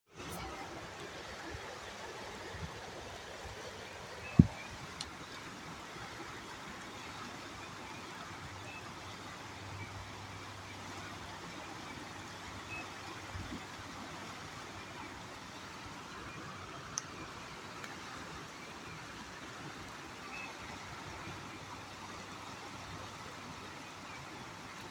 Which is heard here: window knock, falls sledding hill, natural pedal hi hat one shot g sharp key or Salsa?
falls sledding hill